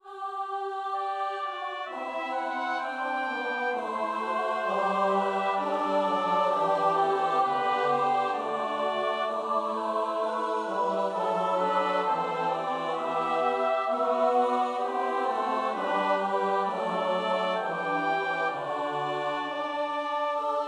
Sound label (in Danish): halleluja i g-dur